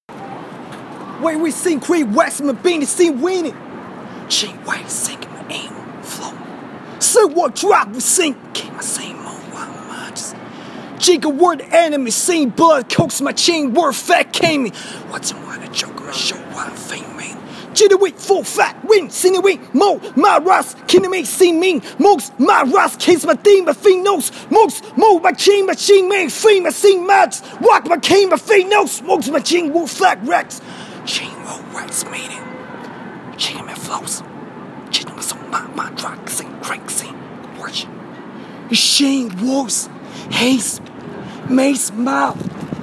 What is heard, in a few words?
Late Night Improvisation; E 33rd St, New York